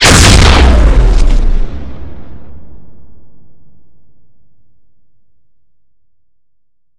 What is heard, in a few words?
beamgun